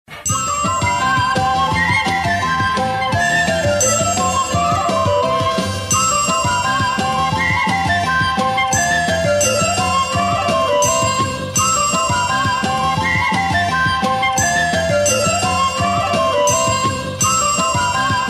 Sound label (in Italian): jingle; Jingle della ruota - Pranzo 1988/91